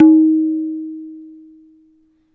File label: tabla tun